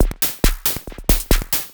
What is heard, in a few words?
percussion loops, Techno; PS-45 WAV Techno ID